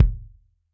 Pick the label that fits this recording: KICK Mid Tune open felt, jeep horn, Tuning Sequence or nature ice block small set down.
KICK Mid Tune open felt